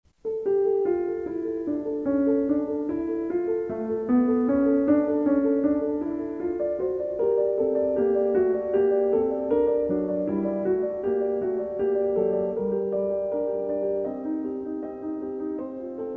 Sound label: fuga